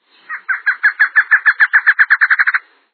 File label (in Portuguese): choca-bate-cabo